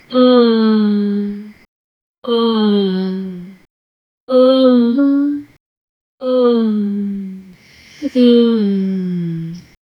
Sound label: a female says muffled mmm; human sounds